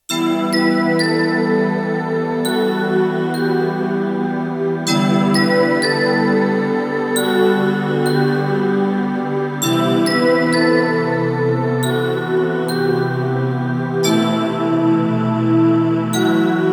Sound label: Roland JX-1, Factory presets; Additional factory patches